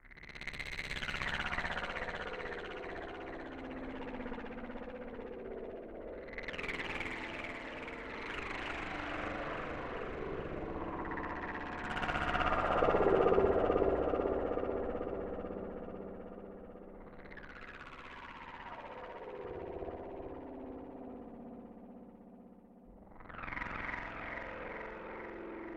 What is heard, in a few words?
glow atmospheric background